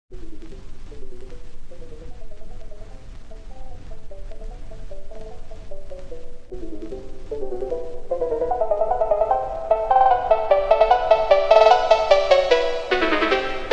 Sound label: turkish march phonk remix; mozart